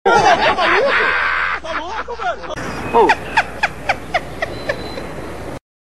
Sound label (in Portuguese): risada; joao kleber